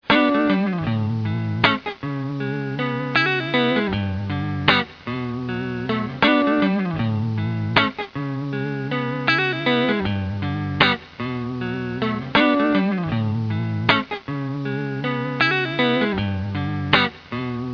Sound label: rotovibe